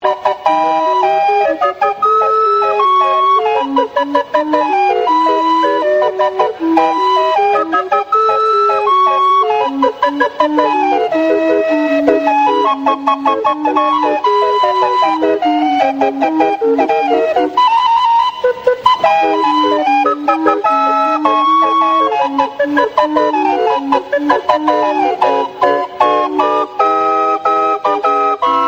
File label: calliope